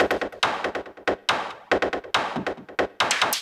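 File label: PERCUSSION ELECTRO 140, Techno Drum; Techno2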